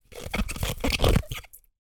household clingfilm plastic wrap roll handling squeaky